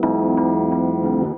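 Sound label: KEYS loop L, Drum & Bass 175 BPM; Ueberschall; Drum & Bass Flash Trackz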